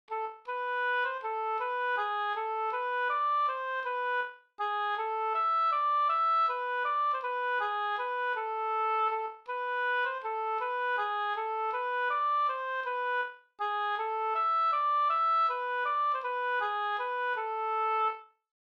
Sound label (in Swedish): langdans; Midsommardans fr Da o Vs Ahlstrom